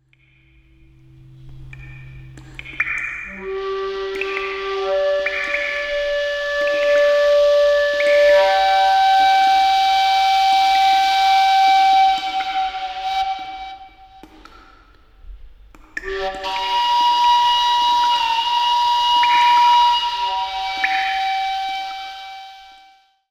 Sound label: Dripping cristals